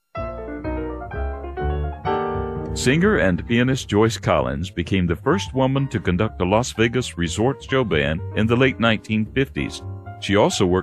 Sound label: Piano Jazz